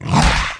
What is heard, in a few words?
warrior attack, bugs; sfx; starship trooper